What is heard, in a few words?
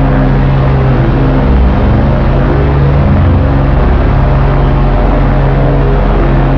Protractor Loop; moul-assets